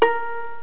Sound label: pluck